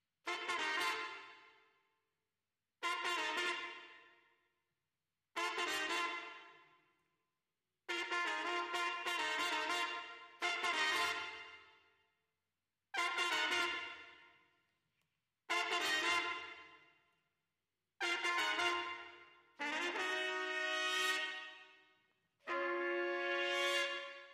Trumpet Clean

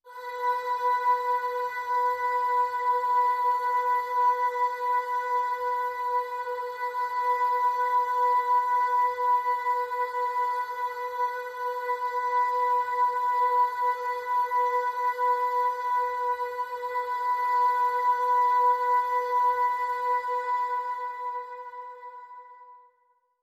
Pop Vocal B

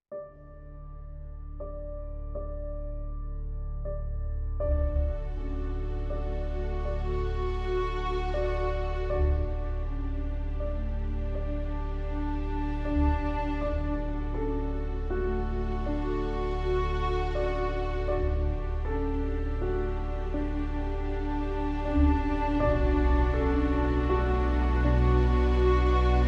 No Percussion

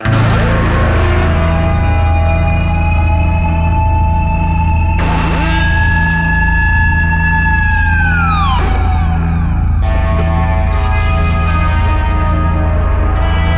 Alien Desert Drive No Drums

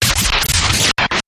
Interrupted Static

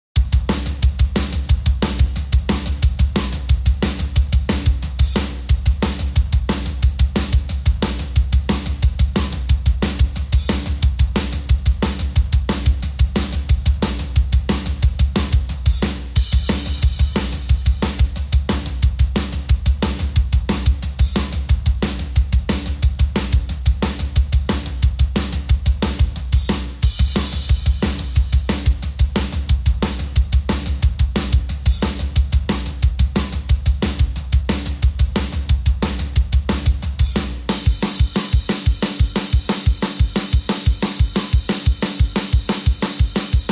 Drums Only

